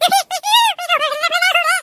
Squeak start

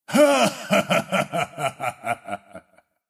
laugh; Pantheon; 潘森; 英雄联盟; 不屈之枪

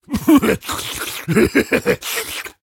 laugh, zh; Drmundo

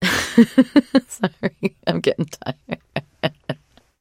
trader laugh; 商人杰恩（七日杀）

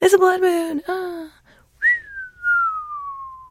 whistling; 商人杰恩（七日杀）

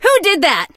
hurt vo

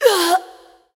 death

